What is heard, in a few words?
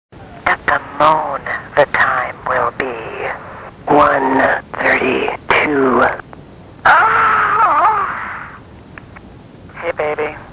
moan